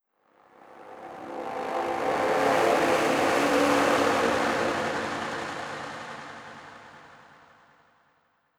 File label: Egypte Intro